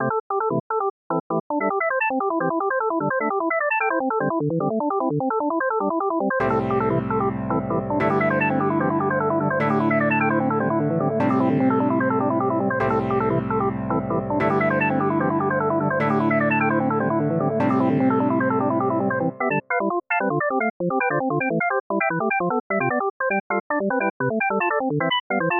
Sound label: fast